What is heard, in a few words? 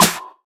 snare one shot f key, Royality free snare sound tuned to the F note. Loudest frequency: 4257Hz; snare drum samples